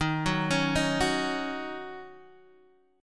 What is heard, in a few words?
D sharp Minor 7th, Guitar, DADGAD